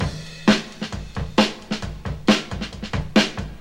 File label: 133 bpm modern drum beat g sharp key, Free drum groove - kick tuned to the G# note